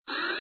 GAMBLE START; Russian

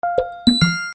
hit; Pinocchio

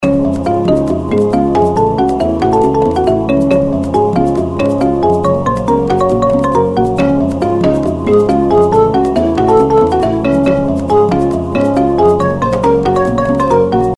outro bgm; Africa Run

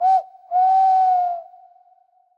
gambler win; In The Forest; Wazdan

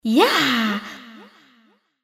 moan; Imperial Girls

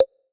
reels stop; Hot Party Deluxe; Wazdan

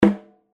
reelspin click; Dreamcatcher

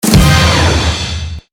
bonus chance; Billyonaire

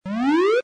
gamble win; American Poker The Legends II

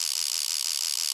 spin roll loop; Wild Guns

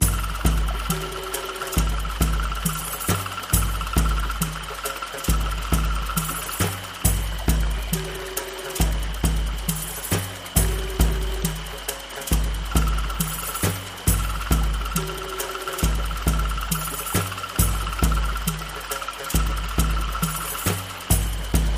bonus wheel ambiance; Wild Ape

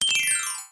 lose; American Poker The Legends II

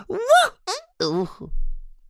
boob; Racetrack Riches; megaboard

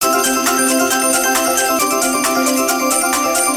count up, slot; Flying Dutchman

